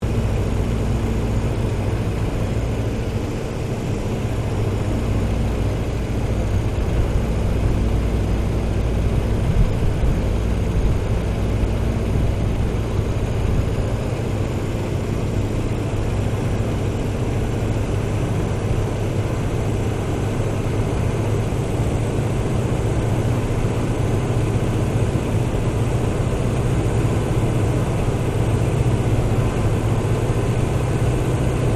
AIRCRAFT 1916 SOPWITH PUP REPLICA FLYING INTERIOR, Replica of a WW1 Sopwith Pup; Airplane; Aircraft 19 16 Sopwith Pup Replica Flying Interior Stereo; Airplanes